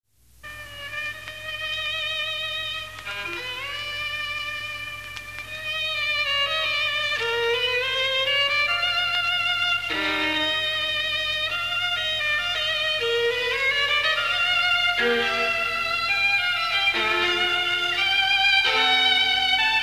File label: Violin; Halloween; Hallmark Halloween 1989